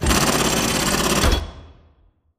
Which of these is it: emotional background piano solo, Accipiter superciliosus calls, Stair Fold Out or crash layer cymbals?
Stair Fold Out